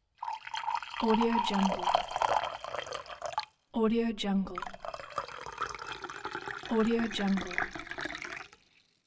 pouring tea in cup

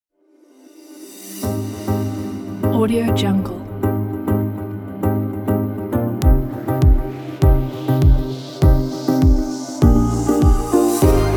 logo; parfume logo

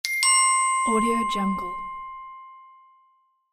message cling

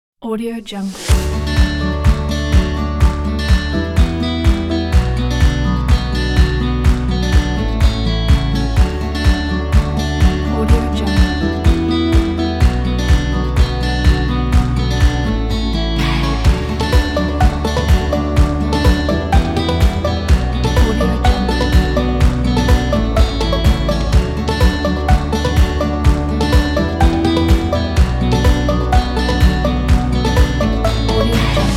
acoustic inspiring upbeat

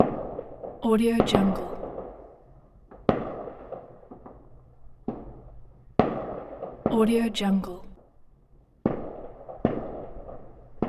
firecracker distant explosions loop; debsound

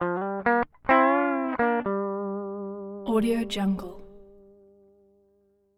electric guitar blues

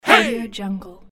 male group shouting hey

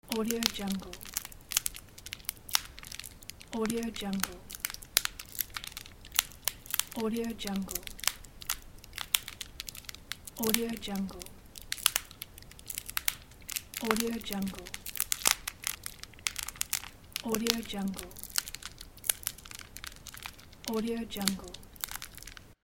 fireplace

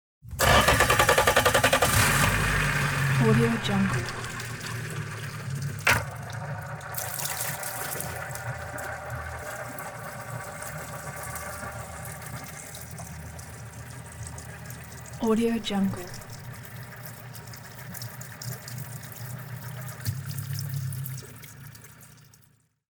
starting boat motor idle running

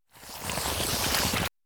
tentacles retract

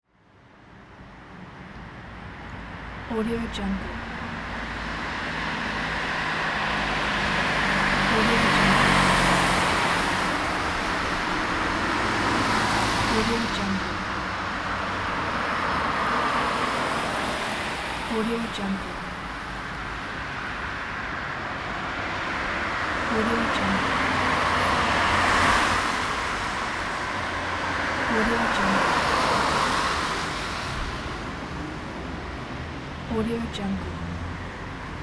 car road traffic

